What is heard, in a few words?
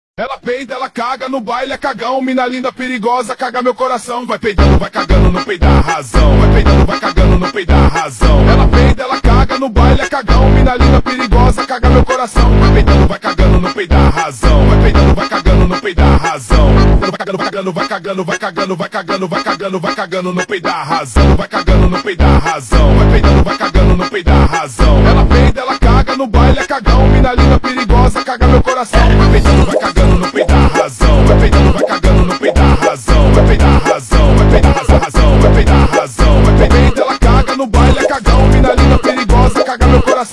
Slowed